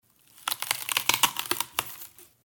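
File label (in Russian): хруст разлома костей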